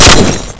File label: turret fire; GoldSrc